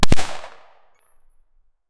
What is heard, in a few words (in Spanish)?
un balaso de un m82, 50 caliber